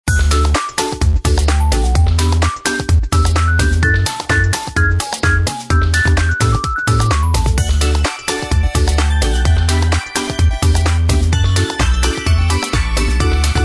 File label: bg music